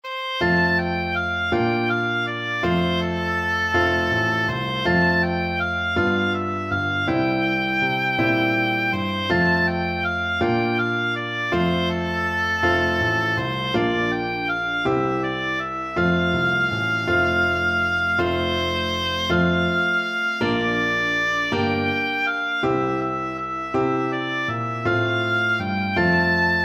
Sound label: my bonnie, oboe